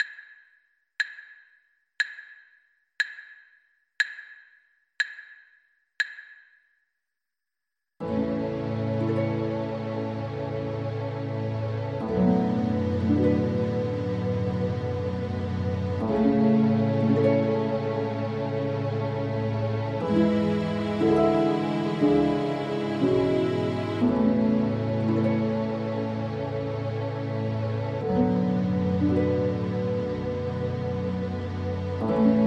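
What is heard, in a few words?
danny boy GUITAR karaoke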